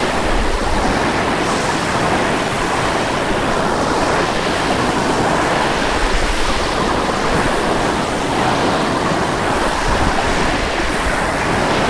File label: waves